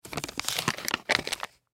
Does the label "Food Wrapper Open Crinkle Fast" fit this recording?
Yes